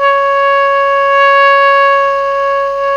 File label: TENOR PP C#5, Saxophones; Greytsounds; Philip Wolfe David Crozier's Saxophones